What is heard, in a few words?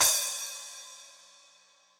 Splash 12, Cymbal; Drum Hits; Standard Hits; Cop Style; Ableton; Factory Packs; Session Drums Studio